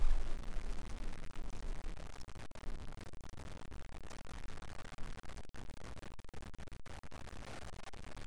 white wagtail, night flight calls in beijing

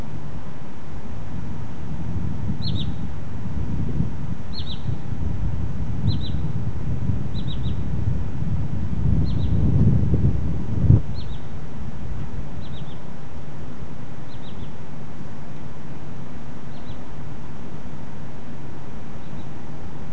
Wood Sandpiper series, nocturnal flight call; Beijing

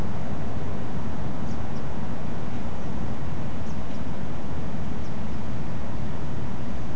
three Blyths Pipit calls; nocturnal flight call; Beijing

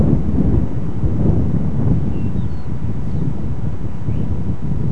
Yellow breasted Bunting song element; nocturnal flight call; Beijing

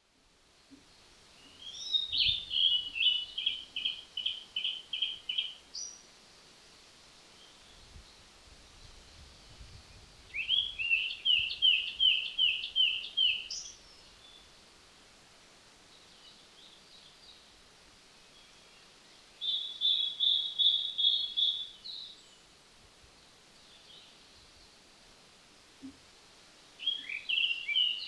Zappeys Flycatcher song; Lingshan

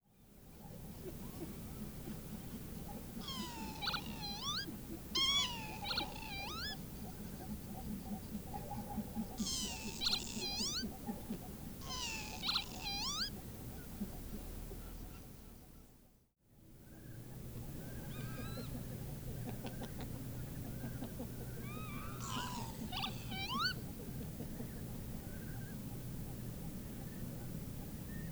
Northern Lapwings; ShaCheng; Beijing

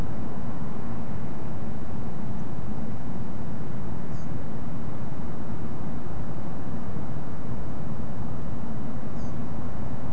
unid interesting 3 calls, nocturnal flight call; nocturnal flight call project in beijing autumn 2021

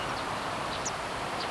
Grey Wagtail call, nocturnal flight call; Beijing

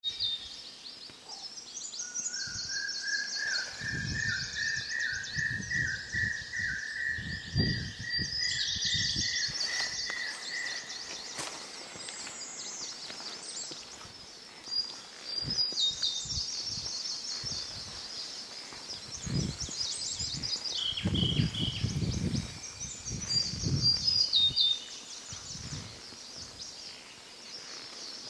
wu ling shan dawn chorus; Large Hawk Cuckoo, Claudia's Leaf Warbler, Hume's Leaf Warbler, Large-billed Leaf Warbler and Siberian Blue Robin

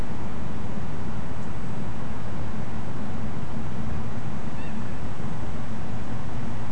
waterbird coot, nocturnal flight call; nocturnal flight call project in beijing autumn 2021

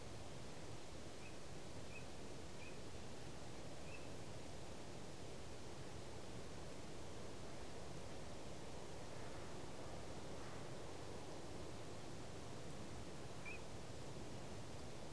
Common Teal series; Lingshan; Beijing; nocturnal flight calls (NFCs)

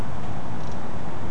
Taiga flycatcher

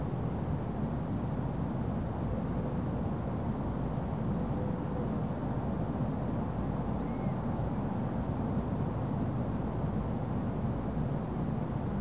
Common Kingfisher, nocturnal flight call; Beijing; nocturnal flight calls (NFCs)

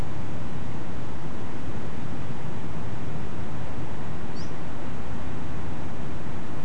unidentified call, nocturnal flight call; nocturnal flight call project in beijing autumn 2021

